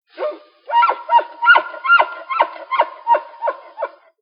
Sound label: Elk bugle grunt; ORION